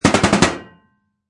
Bullet Machine Gun Impact Metal, Machine gun projectiles impact with metal; Impact Sound Effects